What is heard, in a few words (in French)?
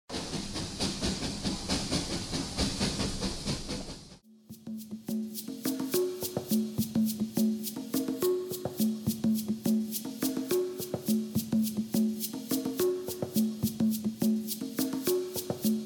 SANS VOIX